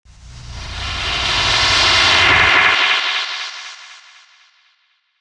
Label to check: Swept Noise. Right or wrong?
Right